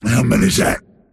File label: last hit pain; pudge